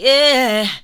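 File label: "yeah", female vocals, left; PlatinumPhattCD2; E-MU Producer Series Vol. 8 – Platinum Phatt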